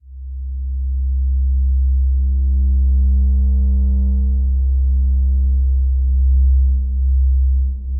Sinus Drone; MPE; Pad; Ableton; Drone Lab

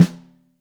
Top, Snare

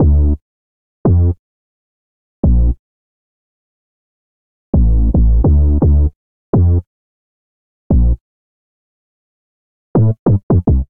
Sub D Minor 88 bpm; MIDI Clips; Tonal; Bassline; New Old; Ableton; Factory Packs; Chop and Swing

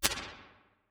Reel Room, Medium Rooms; Convolution; IR Samples; NI Kontakt 5

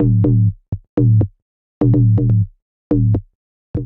Ping It A Minor 124 bpm, Bassline; MIDI Clips; Tonal; Skitter and Step; Ableton Factory Packs

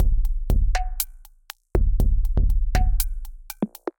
Kit, drums synthesized; Carbonized Kit; Ableton; Factory Packs; Drum Essentials

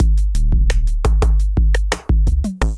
Kit groove 86 bpm; MIDI Clips; Onyx; Ableton; Drum Essentials

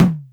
FM, Foundation Drums; Ableton Foundation FreePacks

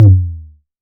modular pitched, Kickbank; mpk mini presets; Ableton Foundation FreePacks

